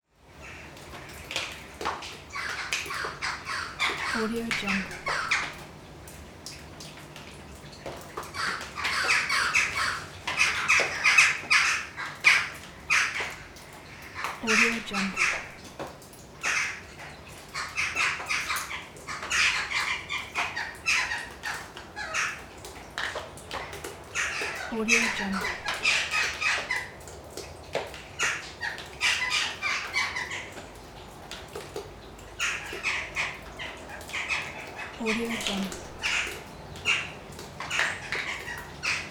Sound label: crows cawing outside abandoned building